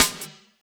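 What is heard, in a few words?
snare drum, Drum & Bass; Ueberschall; Drum & Bass Flash Trackz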